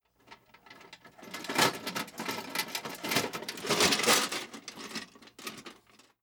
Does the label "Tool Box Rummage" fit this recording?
Yes